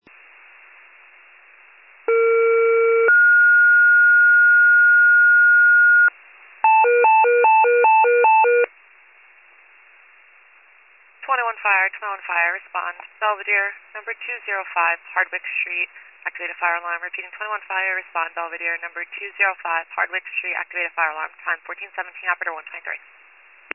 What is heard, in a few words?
FIRE pager alert